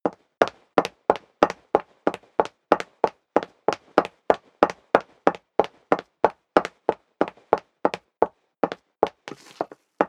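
step high heels wood parquet run sequence, footsteps; schritte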